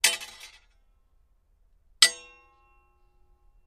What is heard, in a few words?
Sword Clangs Sword, swords